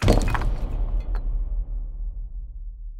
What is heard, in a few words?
spin button click; secret of the stones